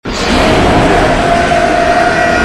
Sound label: jumpscare sound; five nights at elliances 4